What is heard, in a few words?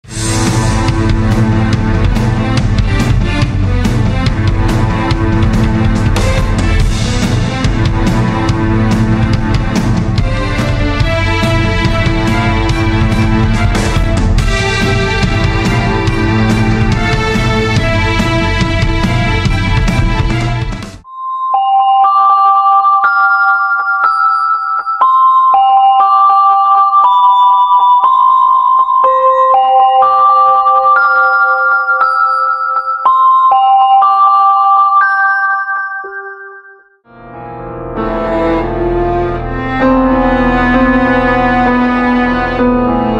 Orchestral Music; Melody of Silence Music Pack